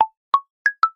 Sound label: Hardwood, notifications; Tecno Pop 9 4G